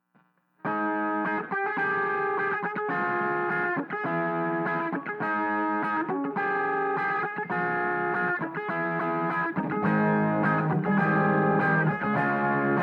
guitar Bus fx

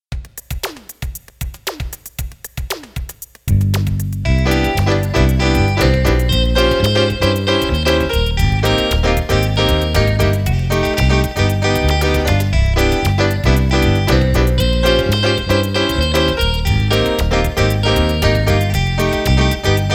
latinish Thing Always On The Piano; Totally cheesy and wild rendition of a Latin-ish thing I often play on the piano, with some extra stuff thrown in just because; MIDI-fied

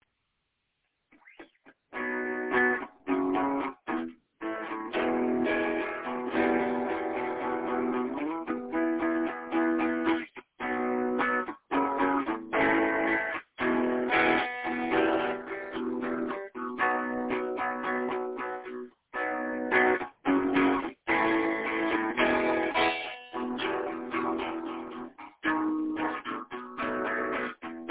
full structure rockin chords

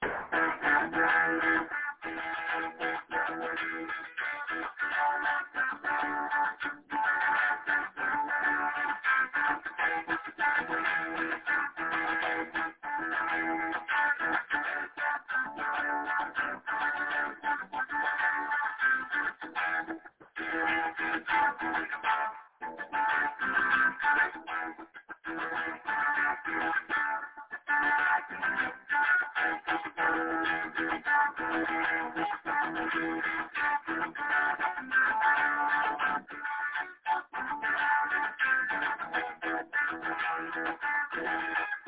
fast spacey rock chords

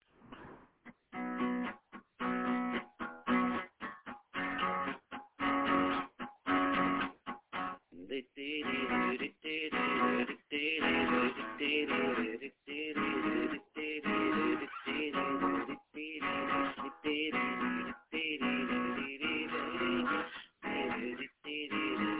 it has a verse AND a chorus

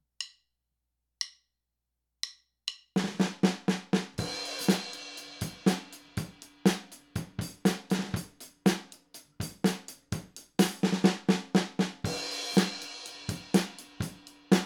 drums SM57

